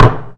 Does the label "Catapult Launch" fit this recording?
Yes